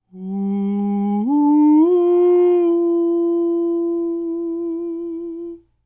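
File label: falsetto, Vocal Planet Jazz FX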